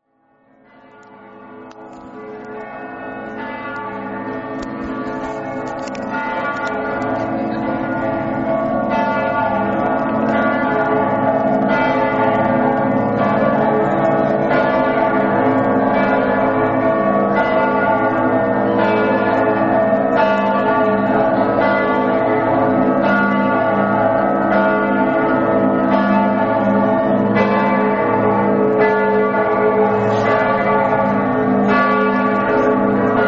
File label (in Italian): udine, campane